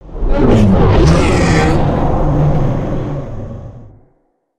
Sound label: skull whoosh